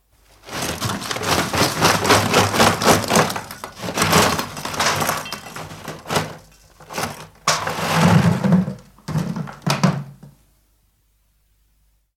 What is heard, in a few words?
emptying hod of coal into grate